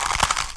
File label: bow shoot pull; tf